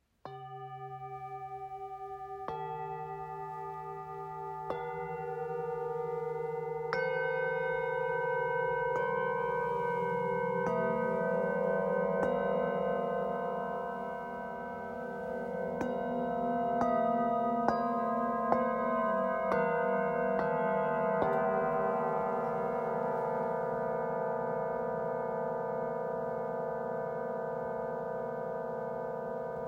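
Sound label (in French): Set bengale, bols chantants tibétains; Krishna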